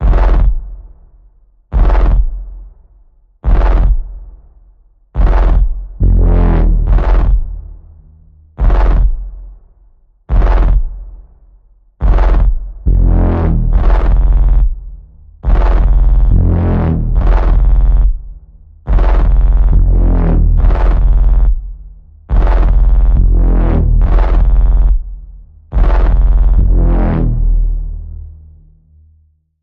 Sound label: Evil Warping Bass Pulse F, Key of F